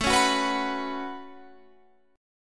C sharp 7th Suspended 4th Sharp 5th, A, guitar chord